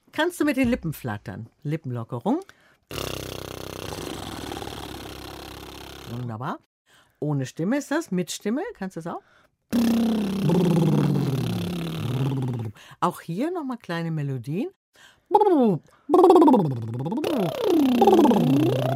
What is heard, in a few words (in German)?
lippenflattern